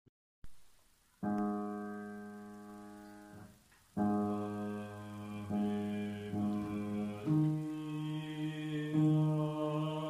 Ave maria bassi

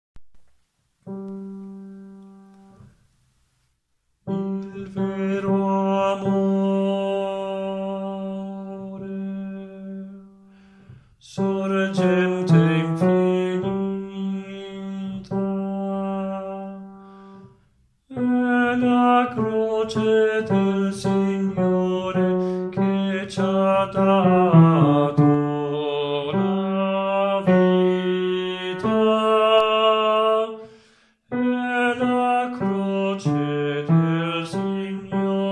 il vero amore baritoni

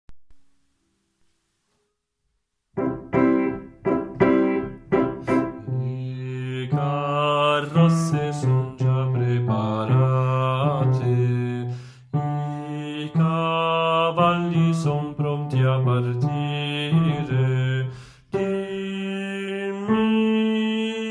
bassi

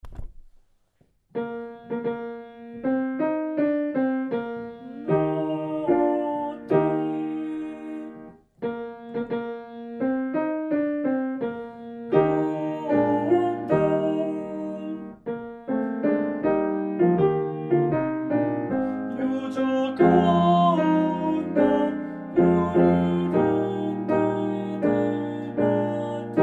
II alti; O felice o chiara notte

